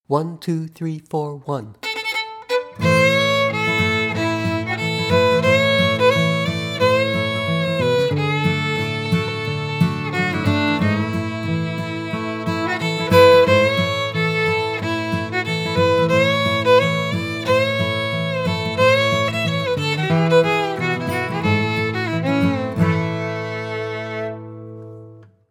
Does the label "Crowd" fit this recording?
No